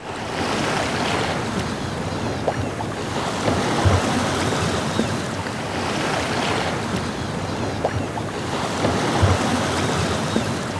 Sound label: SEA, SEA WATER